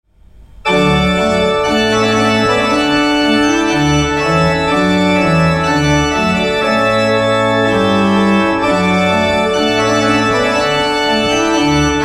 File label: Trumpet Voluntary; Clarke, Jeremiah; Bryllupsmusikk